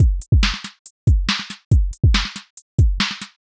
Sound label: distorted breaks loop 140bpm